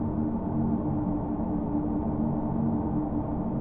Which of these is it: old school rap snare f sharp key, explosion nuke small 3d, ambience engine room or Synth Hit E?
ambience engine room